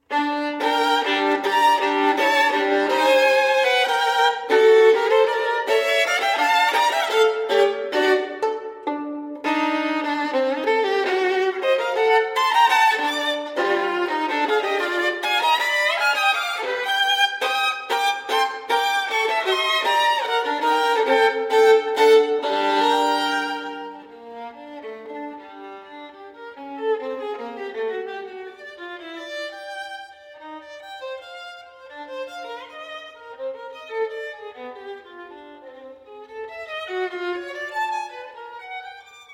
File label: Sonatas For Violin Solo, Sonata No. 1 in G Minor - IV. Finale Con Brio; Ysaye; Op. 27